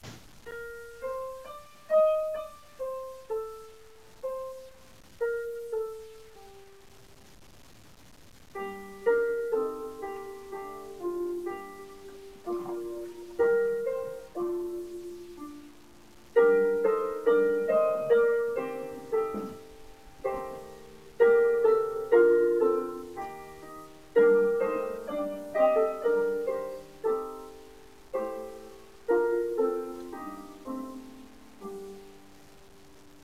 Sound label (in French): Quatre Voix Synthe